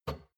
shot glass grab table; berufe; soundpool

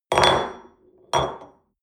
espresso upset table hard, restaurant cafe

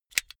car flip key insert hard

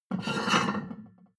plate on plate take slide medium

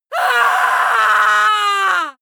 female scream horror; schreie; menschen

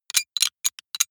sci-fi handling click; comedy-cartoon; pistolen-gewehre

